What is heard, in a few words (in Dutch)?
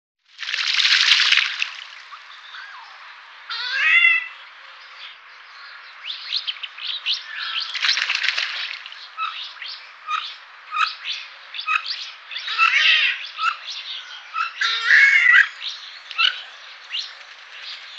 mandarijneend; Zang en andere geluiden van in Meijendel broedende soorten; hdykstra